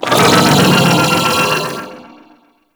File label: Vocal Attack; Warframe; Amalgam Override